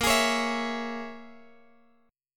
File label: A sharp Minor 7th Flat 5th A sharp, Guitar Open G